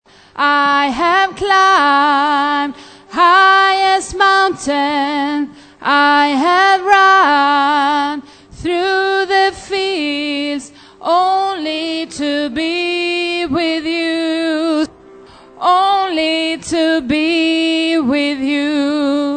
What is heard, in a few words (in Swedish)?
bas